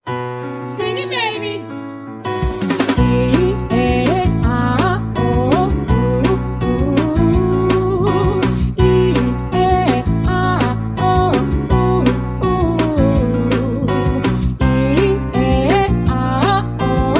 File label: high five